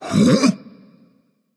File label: surprised, voice; Smite; ymir skin14